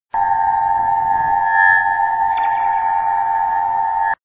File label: insect